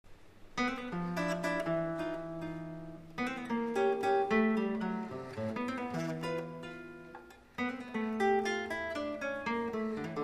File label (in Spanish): El vals del noctambulo guitar